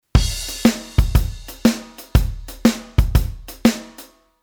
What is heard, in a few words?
Midi Quantize Swing 100